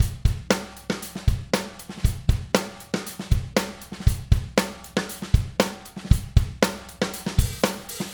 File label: Drum Loop